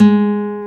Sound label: NOTE, Acoustic; Sound & Vision; Gigapack I CD 2; GUI ACOUST.; GUI Acoustic 1